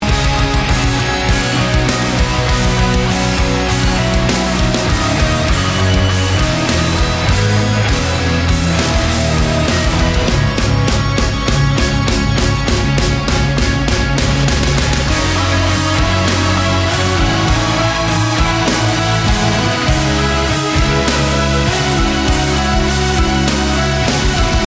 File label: Instrumental